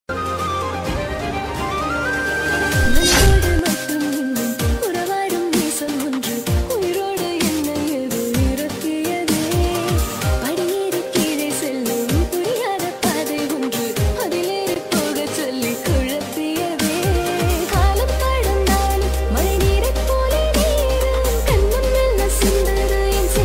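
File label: love song, tamil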